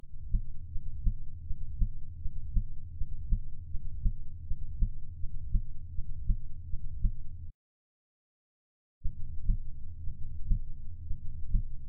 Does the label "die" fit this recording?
No